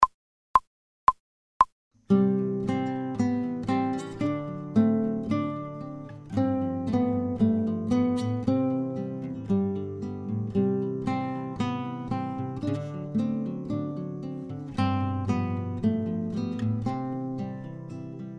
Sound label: Aura Lee fast, guitar